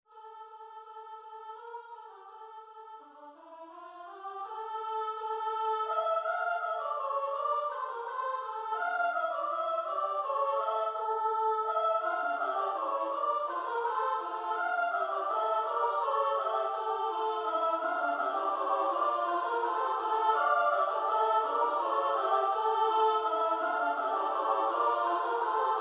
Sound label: midi kukulka kanon; nowowiejski